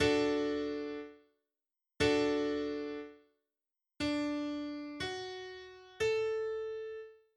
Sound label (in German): dreiklang; Musik; Realschule